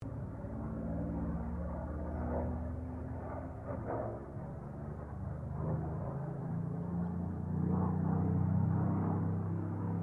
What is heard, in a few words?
AIRCRAFT SUPERMARINE SPITFIRE MK-XVI LOOP; Airplanes